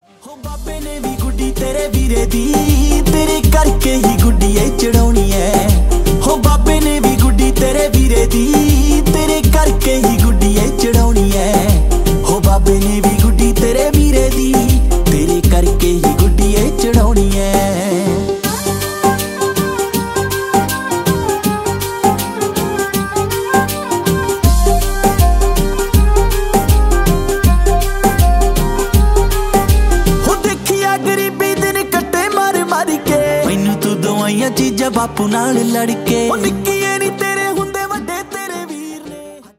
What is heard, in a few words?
Punjabi Song